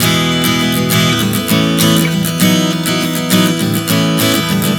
E-A-B-A 100 bpm, acoustic guitar chord progressions